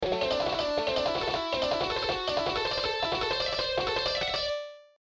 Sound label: Am6 Licks; Am6 Pentatonic Shredding Licks